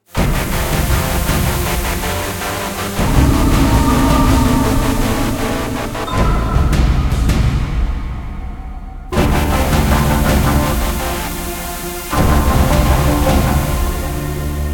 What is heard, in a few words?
Slow Light; Iron Soul